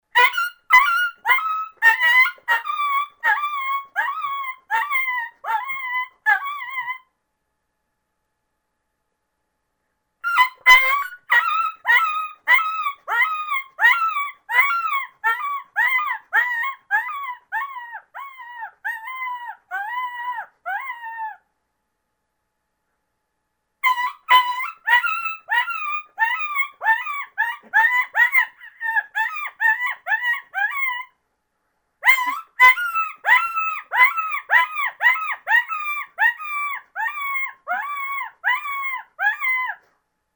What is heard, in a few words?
Coyote Pup Distress